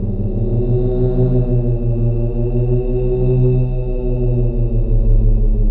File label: torture; unreal